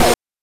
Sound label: BANK2 SFX, NES DPCM; MIDINES